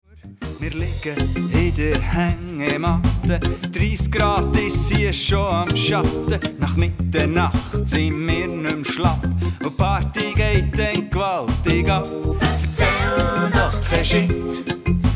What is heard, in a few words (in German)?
reggae kurz